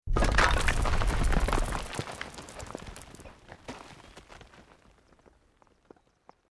ROCK DIRT SLIDE SMALL; Created dramatic effects of buildings collapsing or breaking; Materials

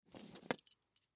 Belt Leather Pick, Belt Leather Pick Up; Pick Ups; Foley; Blastwave

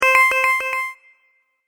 Echo Interface Sound, high tech

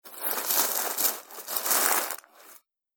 Packaging Paper Noises; foley

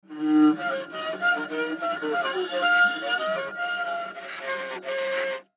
Cartoon Autoharp Play Scrape Strings Musical; Blastwave

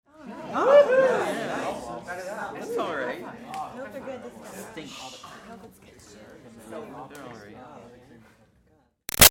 Crowd High school Class Happy Reactions Light; Clowning Around; Laughs; Backgrounds; Schools; Big Room Sound

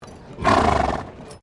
Wagon Horsedrawn 4 Horse Snorting

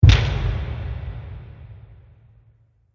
Monster Footstep; Monster Sound Effects; horror

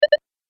Game Pause, video game

